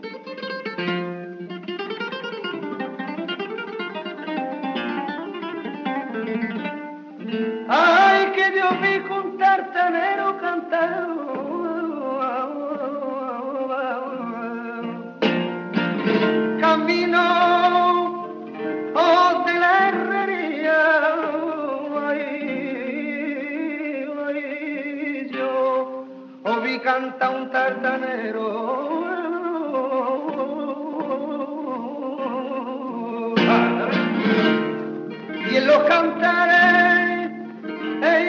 Taranta, flamenco; de Pepe Marchena

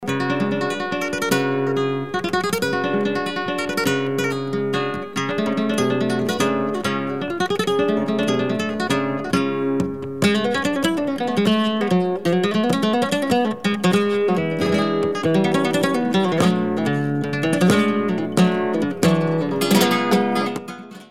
Bulerias, flamenco

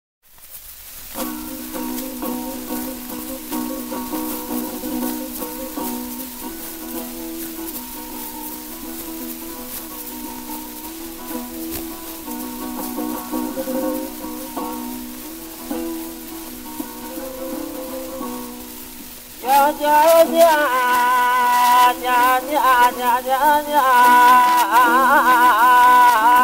solea, flamenco

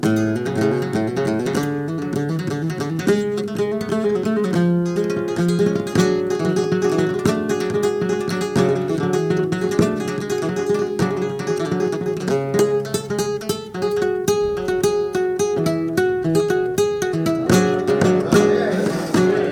Tientos falseta, flamenco

